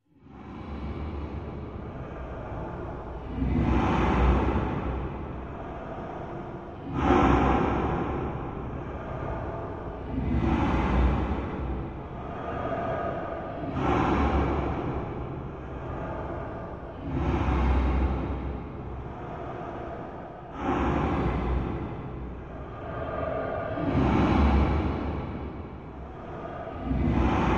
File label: Animal Breath; Eerie And Low, With Heavy Reverb. Use For Monster.; Animal Vehicle Growls